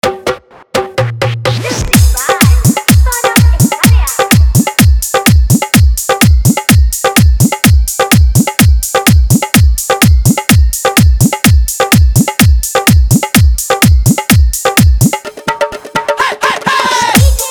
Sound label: Bhojpuri Hard Dhollki Bass Mix